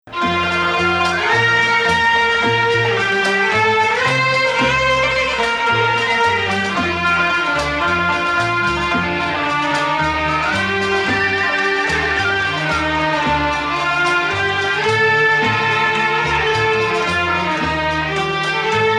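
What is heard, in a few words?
Ajam, Arabic music; Ajam, which resembles the Western Major scale, has two distinct versions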